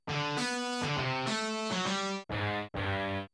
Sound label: Rock Guitar